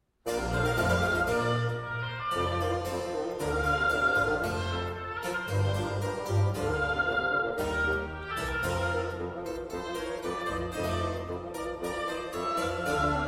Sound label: Sonata In Bb Major for two oboes, bassoon, continuo; No.3; Mvmt II